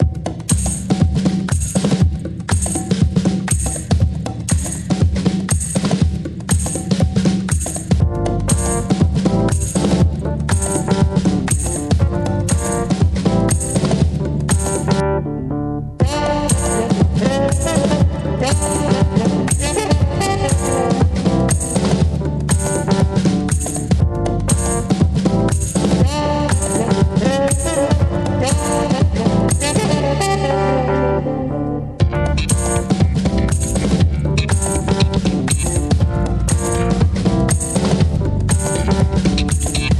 Rhythmical Funk Vibes

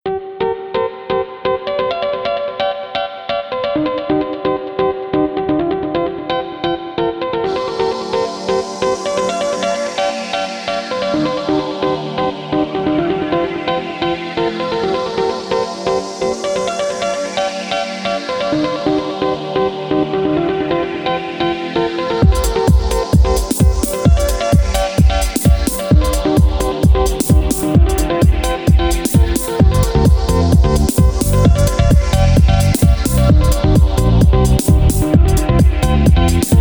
techno trip 432 hz

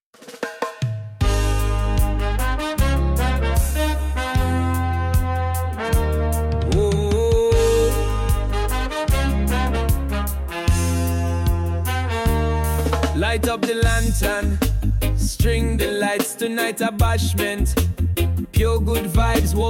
reggae

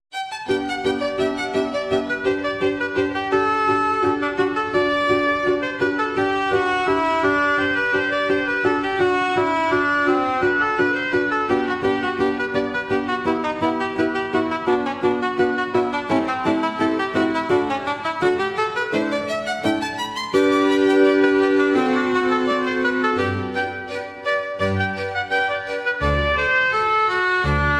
Baroque Splendor

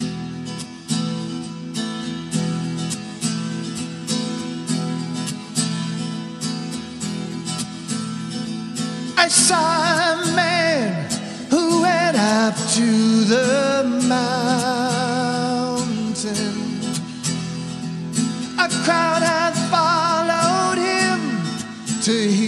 chillout singing dance 432 HZ; I WILL CALL YOU

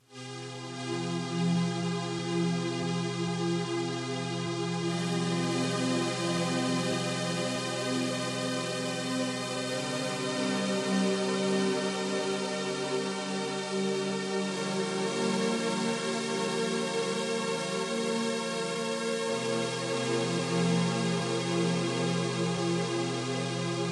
ambient meditation AMBIENT; SECRET

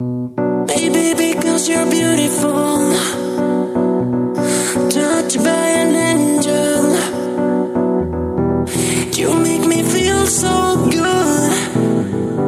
Disco Chill Vibes

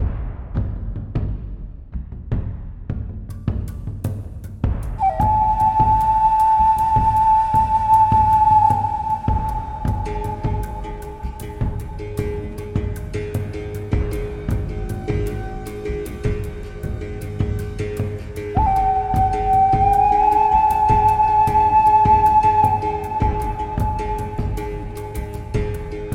american flutes 432 hz

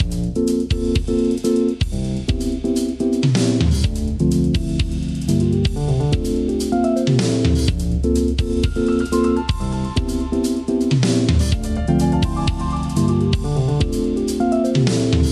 Rhythmical Funk Vibes

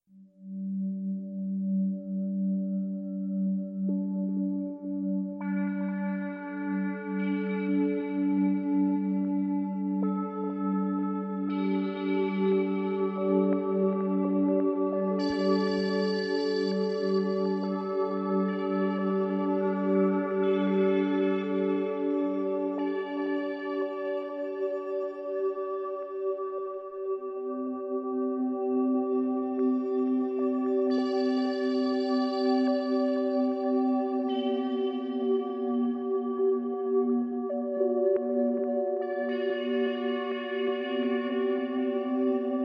432 Hz

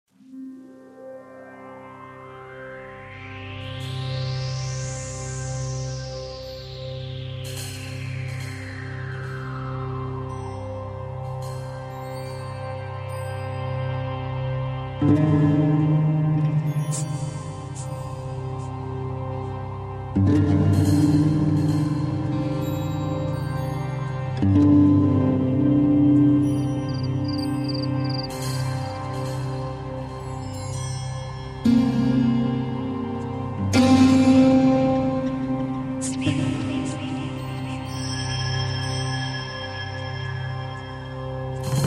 ambient with nature; modlitwa wiatru

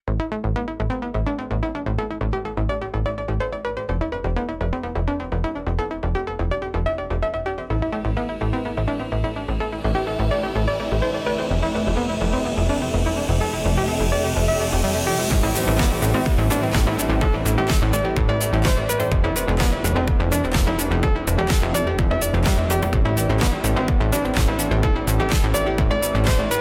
Electronic Impulse

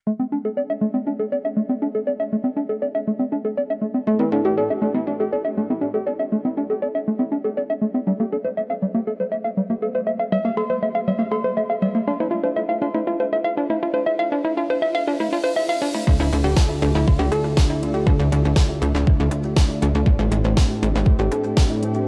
Electronic Impulse

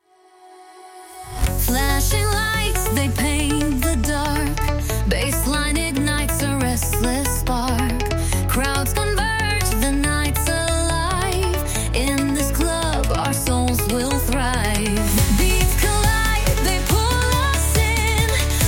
Nightclub

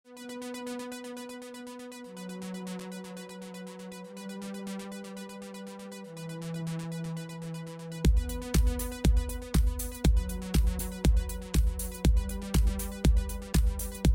music dance; fast steep

